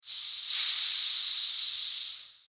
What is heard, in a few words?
Sci Fi Air Release Expel Hose Pneumatic; Spacecraft; Blastwave